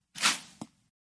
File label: Arrow Swish Regular